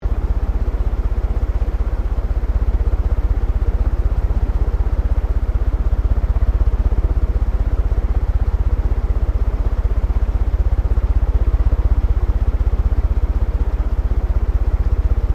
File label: FAN CEILING FAST ABOVE LOOP; Various fans; Electronics